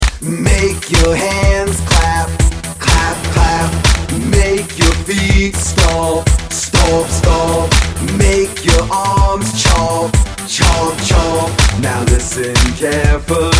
Clap, Stomp & Chomp (Syllable Song); Learn syllables

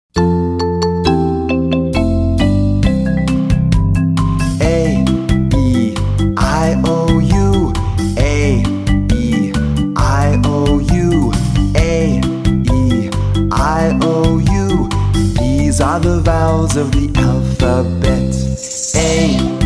Vowel sound samba, Songs for reading development

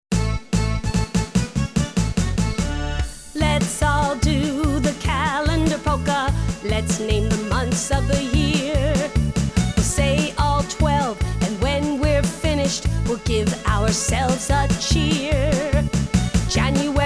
calendar polka; months of the year

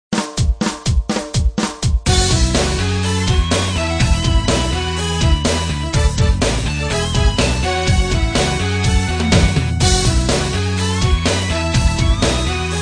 Eights Instrumental

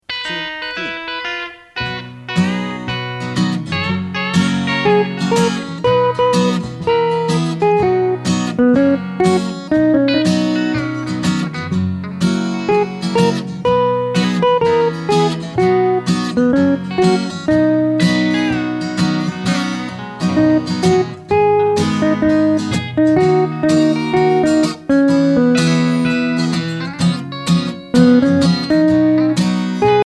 instru; macbeth

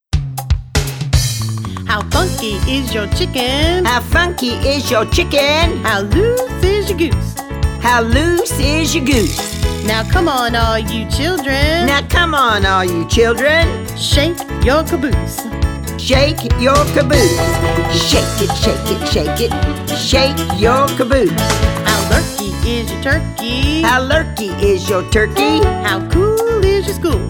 funky chicken

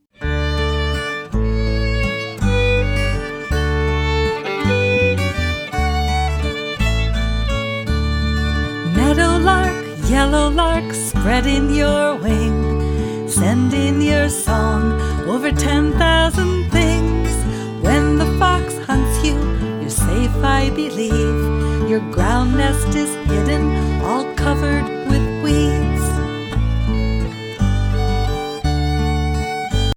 waltz

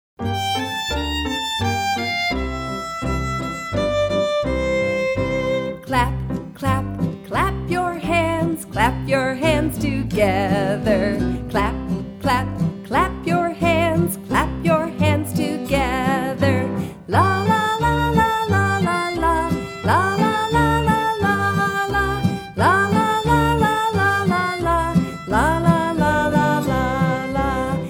clap clap clap

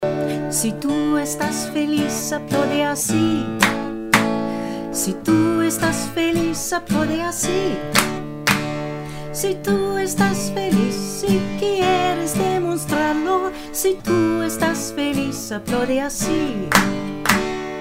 si tu estas feliz vocal, Spanish Song

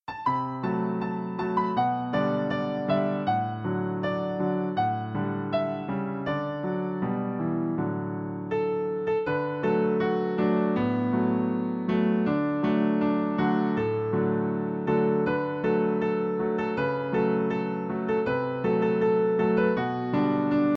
johnny appleseed instr; folk

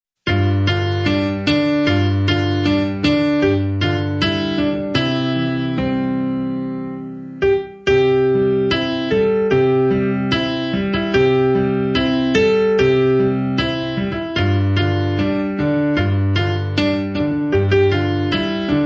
its raining piano

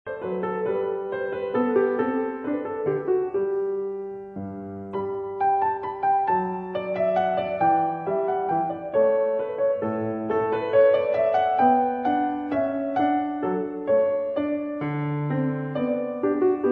Minuet In G; Johann Sebastian Bach; classical masters

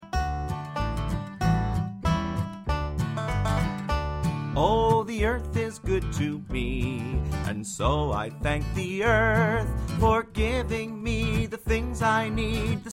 johnny appleseed earth vocal; folk

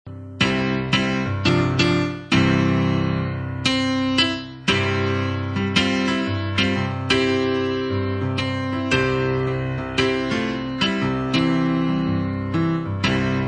piano

